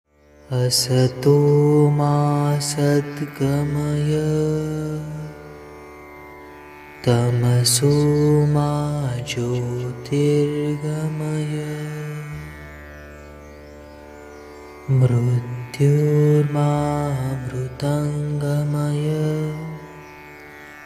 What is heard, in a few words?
Mantra Asatoma sadgamaya ringtone